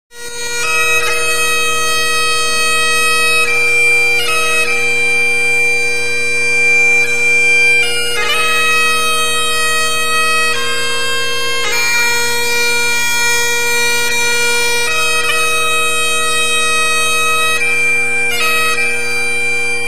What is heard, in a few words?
irish bagpipes; ringtones